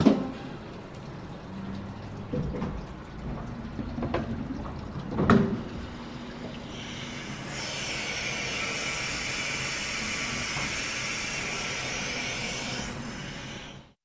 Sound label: Mill Machine, hydraulic